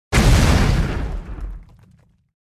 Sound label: Explosion close up c4 plastic debris; Bomb